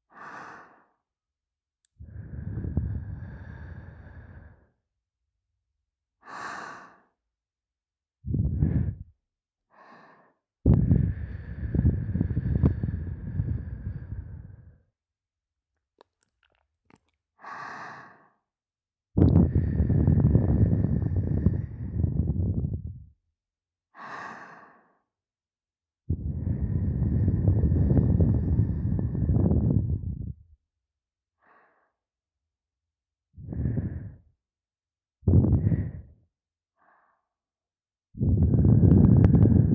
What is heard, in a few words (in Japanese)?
ふーっ…ふーっ…, ASMR